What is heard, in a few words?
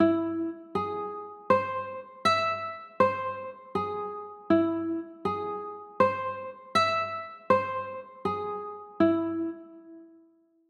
a flat aug, chords